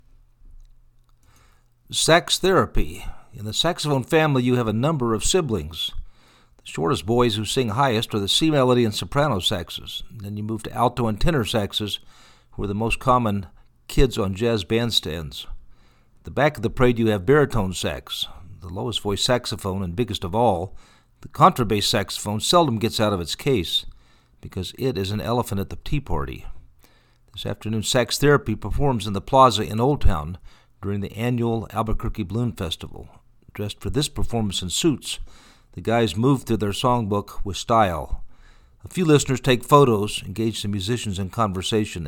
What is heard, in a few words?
sax